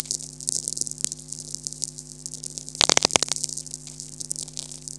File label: pops